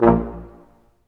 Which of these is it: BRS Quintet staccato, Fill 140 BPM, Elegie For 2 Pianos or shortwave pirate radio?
BRS Quintet staccato